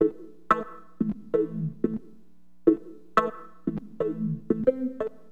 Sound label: RESO, Keyboards; HipHop Keyboards; LiveAct Pool 1